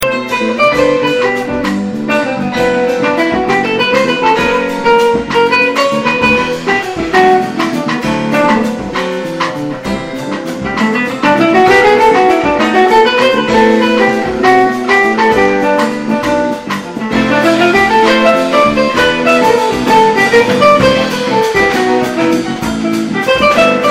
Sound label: cel, bass